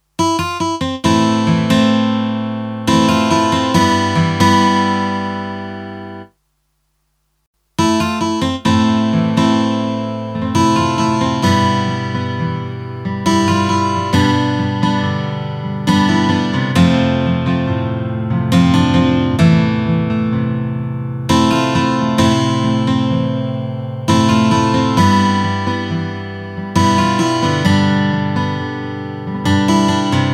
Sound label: Lexicon PCM70 Chorus Echo Guitar